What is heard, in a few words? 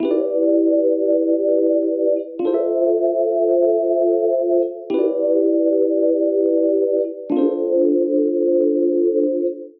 Neo Soul Glide Pad Progression Am 93bpm; MIDI Clips; Accompaniment; Ableton; Chop and Swing